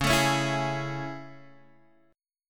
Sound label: A Major 7th Db